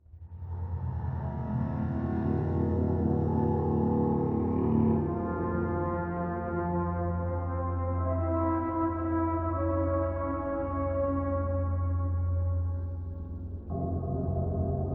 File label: Music Safe Landing